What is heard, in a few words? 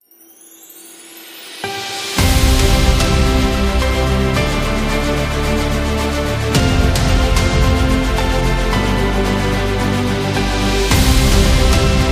Epic Award